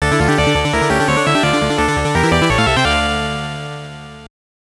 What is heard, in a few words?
All Clear; Castlevania Rondo of Blood; PC Engine CD